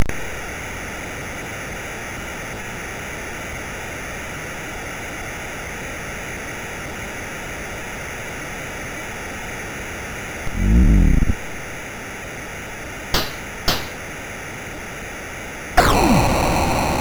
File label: Big Funk plus FX, MOS6581R4